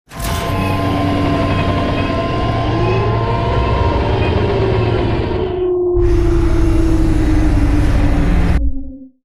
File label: Sideways Town Giant Floor Panel Arrive Rotate Lock Servo; Boomtown; Titanfall 2